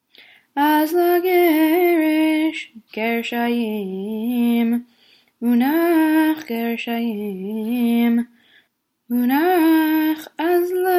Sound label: polish lithuanian regalim אזלא